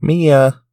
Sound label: Chorus voice